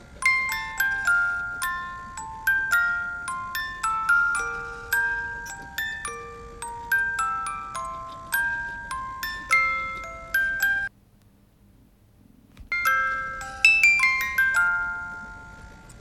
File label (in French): boite a musique; memoiresdoceans; jeux et jouets; chasse au tresor